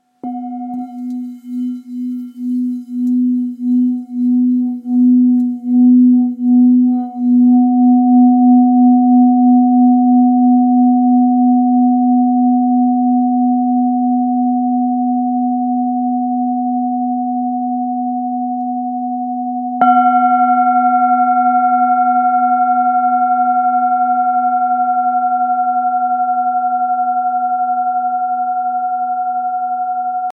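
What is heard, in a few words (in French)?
Bol thick si, bol chantant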